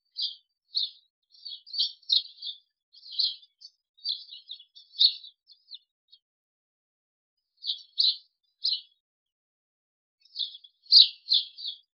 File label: the flapping sound of a, bird